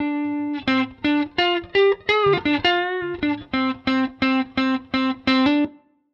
Guitar Line, hiphop; musicradar

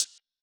Tech Drum Hit, techno 120bpm

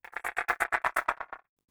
The Clatters, techno drum fx; Single Hits

